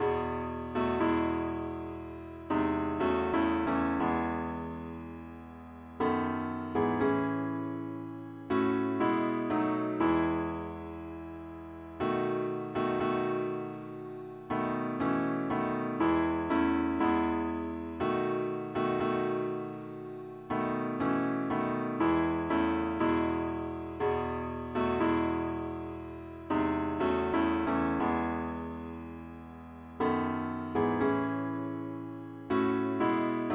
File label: parts only key e